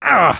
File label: Spy taunt exert